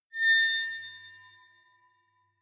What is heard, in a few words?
aquaphone fast